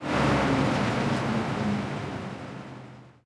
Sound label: engines; World of Tanks